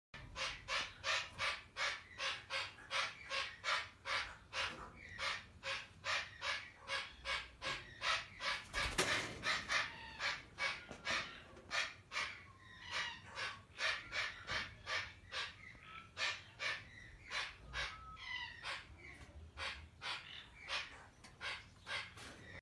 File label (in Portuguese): Canto do aracari mulato